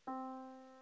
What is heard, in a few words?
Yamaha Disk, piano sound